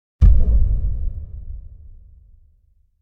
Impact Drum Dull Low Reverberant Hit; Hollywood Edge; sound designer's toolkit v2

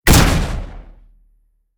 Shot Gun Fire; Blind Survivor

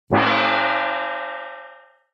Metal Pan Ping Hit - Large Baking Tin; Hollywood Edge; sound designer's toolkit v2

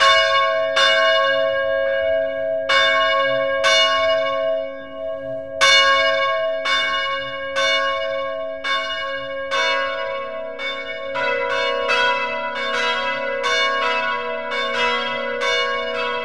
bells church; best service Blue Box

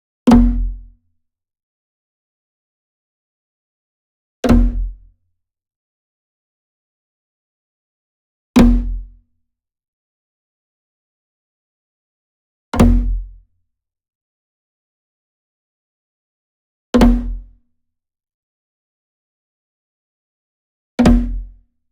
Wood Percussion Wood Drum Floor Flam Slap Near; boom library; Cinematic Strikes Construction Kit

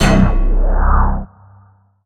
Pickup Scifi; SFX Kit

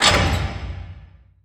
Door Large Metal Stop; Doors

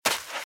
Footsteps - Sand - Dry - Run - Left; RadwinIsland

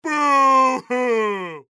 bad roll; Dice World

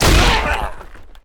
Football Hit Tackle

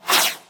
Gun, Flare, Single Shot; gun and weapon sounds; captain audio; fully loaded

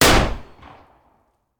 Hand Gun; Sound Ideas; Guns

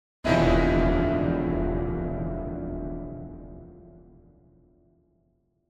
Piano Danger Chord; Hollywood Edge; sound designer's toolkit v2

